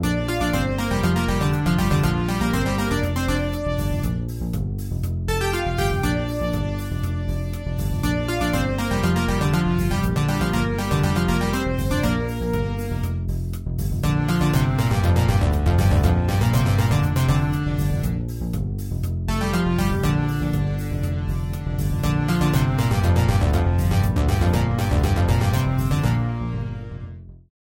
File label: lead polyphonic sawtooth